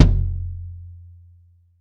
RITUAL, Old World Instruments, Drums Percussion, Ritual Drums; E-MU Producer Series Vol. 7 – Old World Instruments